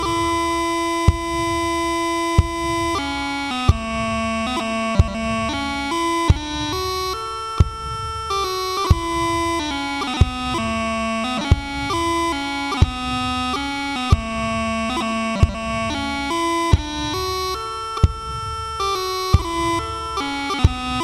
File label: Endearing Young Charms 46 bpm, piping; highlanders